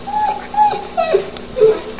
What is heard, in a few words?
dog whine; soundbible